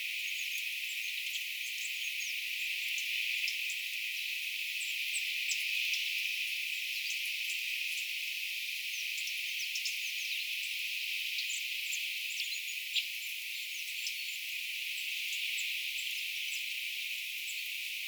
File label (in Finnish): tassa osa on ilm sen ruokinnalla kayneen puukiipijalinnun aania